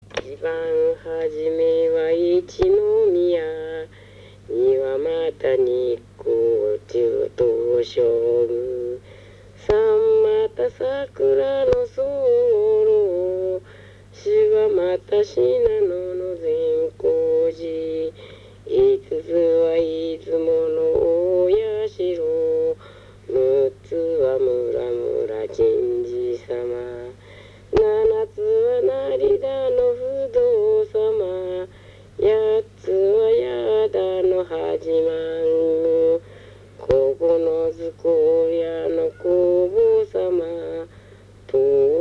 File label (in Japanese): temariuta, minyou; てまりうた; 遊戯歌; 鋸南町; 保田